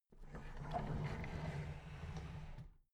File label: drawer big clatter close soft